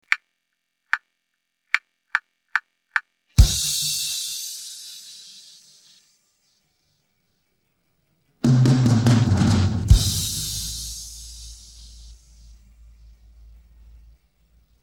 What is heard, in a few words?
intro, drums